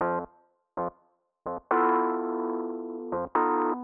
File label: 125 Rhodes C; PowerFX - Nu Jazz House